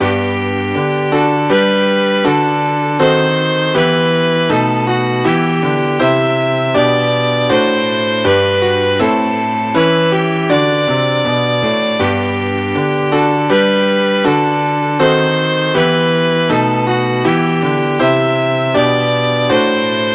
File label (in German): klavier u melodie